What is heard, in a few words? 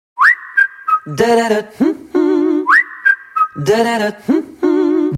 the wistle; hip hop